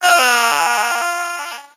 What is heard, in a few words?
8 bit die vo